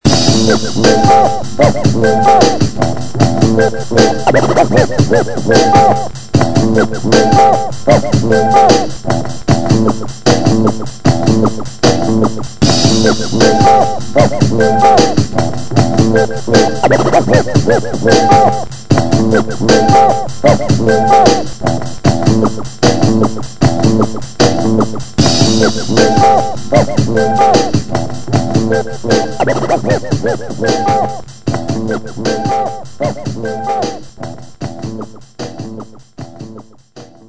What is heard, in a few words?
scratch musician